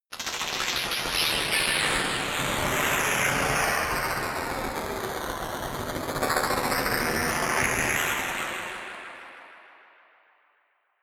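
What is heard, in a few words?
scuttle, science fiction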